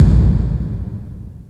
hit, IMPACTS & HITS; Techno Trance; FX & ATMO; Techno Trance Essentials

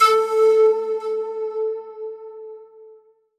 ethno, ethnic; Techno Trance Essentials

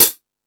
Rock N Roll, Drum Machines

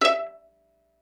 pizz E4, pizz section; Greytsounds VOL-1

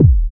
BD, BD Dance; Sound & Vision; Gigapack I CD 1

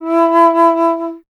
Alto Flute; Miroslav Vitous - Solo Instruments VOL-2

